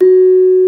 GENDER, Bronze Gamelan

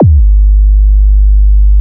SY KICK, SYNTH KICKS; Best Service Alpha Dance vol.2

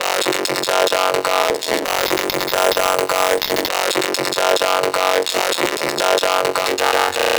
Vocoder; Sonic Foundry (Sony Creative Software); Afterhours EDM

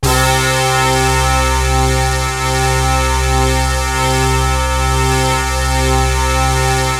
POPBRASS; MORPHEUS

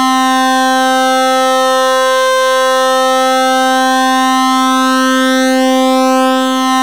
2x pulse, raw osc pads; Zero-G Ambient 1

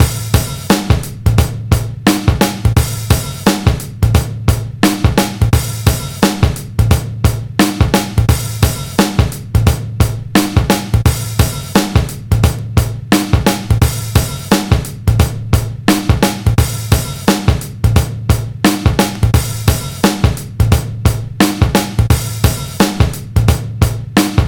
87 bpm, Breaks; CAN CAN; POTBreaks

